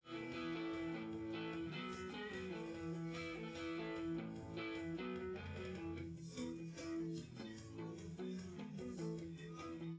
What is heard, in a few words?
drums live rec